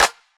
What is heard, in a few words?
sharp hand clap sound g key, Royality free hand clap tuned to the G note; single hits one shots